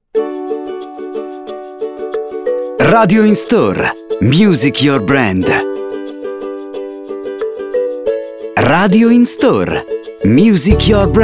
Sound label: Positive Soft